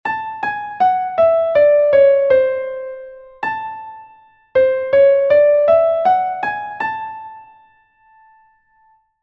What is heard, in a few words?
the diminished seventh; Essentials of Music Theory: Elementary